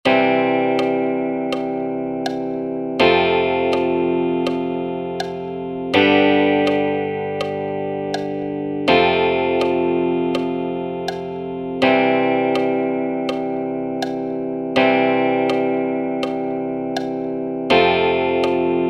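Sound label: 1 4 5 exercise slower, guitar; theory and technique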